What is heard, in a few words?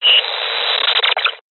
science fiction radio signal interference burst